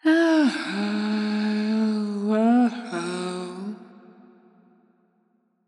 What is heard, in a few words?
Ah Ohoh, Vocals; GhostHack